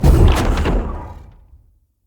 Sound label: Laser Rune Deactivate Burst; Runes